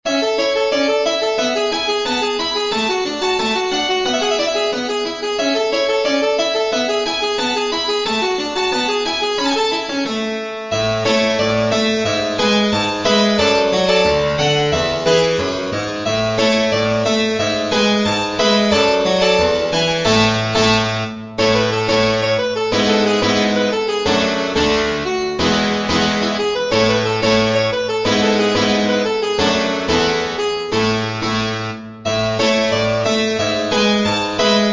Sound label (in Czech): ordinarium sanctus klavir; ordinarium IV